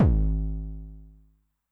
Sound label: BD MOD Overdrive Med, bass drum, 909; classic machines; 909mod